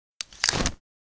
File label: Small umbrella close